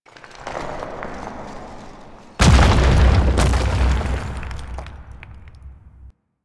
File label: Rock Fall Close; Warframe; The New War; Lua War Room